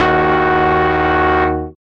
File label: Fat Synth D3; Moon